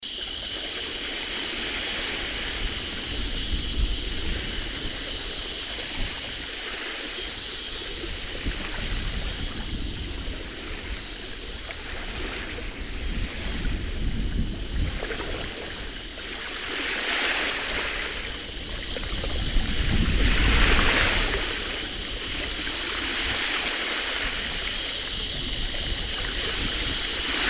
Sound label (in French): Brise d'ete de bord de mer